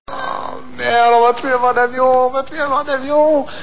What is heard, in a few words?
airplane